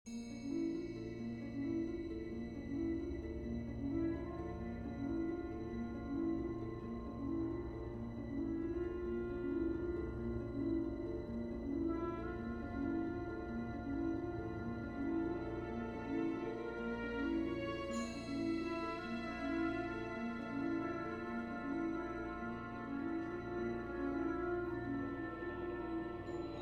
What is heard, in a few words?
Chamber Choir; Tapiola Chamber Choir; II. Amour (Love)